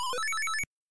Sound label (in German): Schuss; Dig Dug